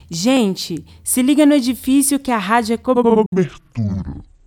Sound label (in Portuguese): vinheta